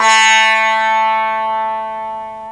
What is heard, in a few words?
SITAR, ETHNIC; AKAI S-Series CD-ROM Sound Library VOL-8; SET#1 ETHNIC